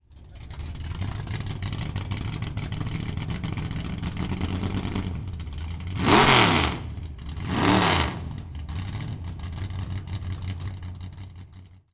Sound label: 1938 Chevrolet Coupe